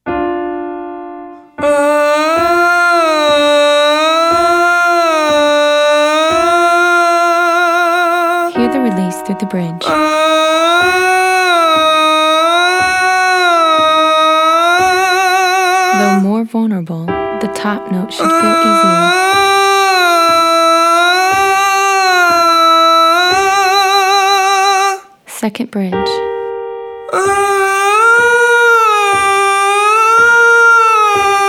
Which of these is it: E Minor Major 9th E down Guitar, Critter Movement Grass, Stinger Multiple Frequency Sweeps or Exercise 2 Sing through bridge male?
Exercise 2 Sing through bridge male